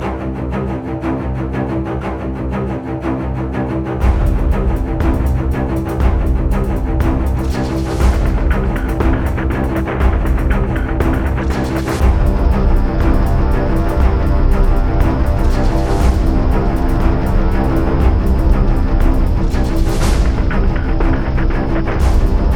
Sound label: 120bpm LOOP; LogLog Games; Epic Combat Music Pack; For Better Tomorrow